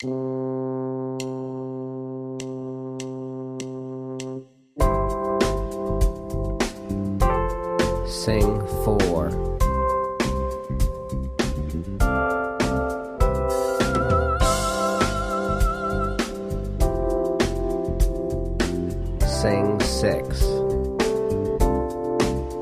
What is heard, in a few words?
Slash Chords Funk Soprano; slash chord ear training